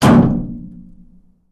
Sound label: Heavy Metal Impact